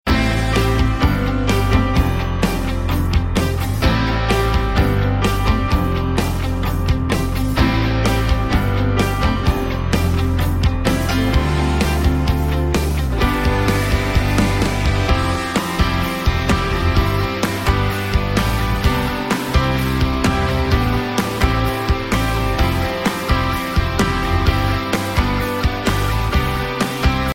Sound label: Instrumental